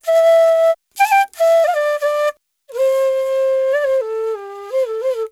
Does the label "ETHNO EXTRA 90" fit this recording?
Yes